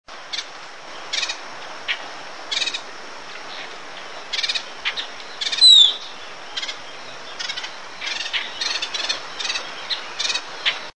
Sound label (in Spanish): voz tordo músico